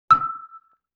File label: Xylophone Single Note E; Vintage Cartoon; Musical Elements